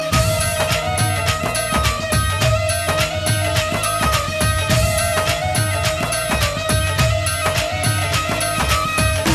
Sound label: Punjabi